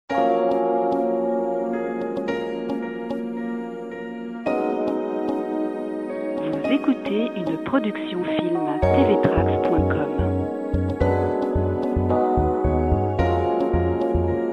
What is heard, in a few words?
jazz